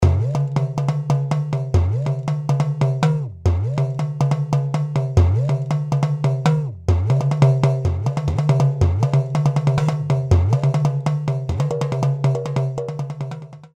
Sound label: eddaka